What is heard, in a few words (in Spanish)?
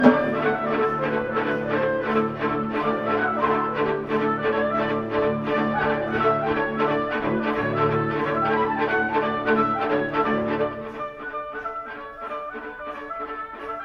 Viento madera